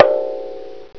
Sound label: MBIRA